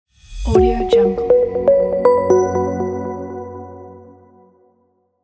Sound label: media logo intro; sawtooz